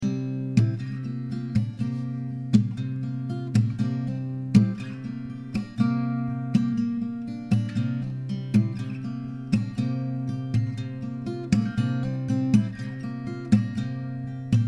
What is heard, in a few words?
C120 Acou GTR motif